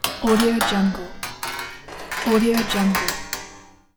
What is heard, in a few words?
metal spring stretch